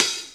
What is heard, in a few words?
tamed open hi hat d sharp key